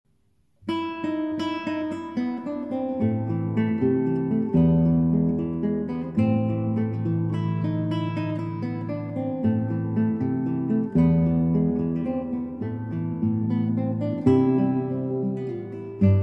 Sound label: classic guitar; fur elise; ludwig van beethoven